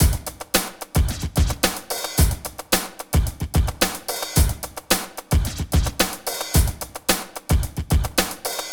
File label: 110 tekkn, loops, hyper dance; Best Service